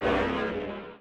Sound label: Dark Monster voice hit